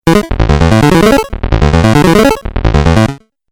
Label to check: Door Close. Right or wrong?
Wrong